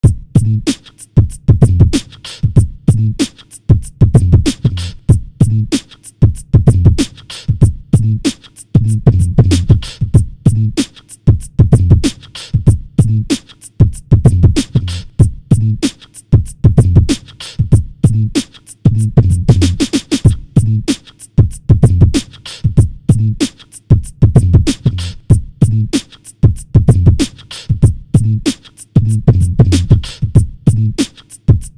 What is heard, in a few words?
beatboxing bbox style